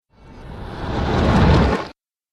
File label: Stone Wall Secret Exit, stone door